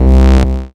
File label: synth